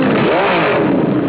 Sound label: gas